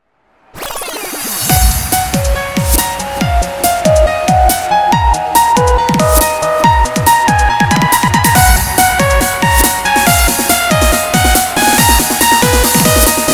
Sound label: Cute Techno